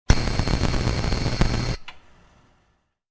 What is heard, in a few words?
Electric arc buzz